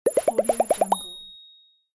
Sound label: bubbles